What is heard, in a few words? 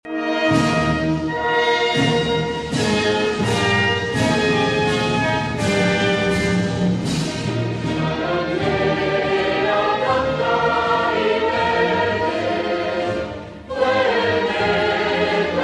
Regional Anthem of Andalucía Spain Himno Andaluz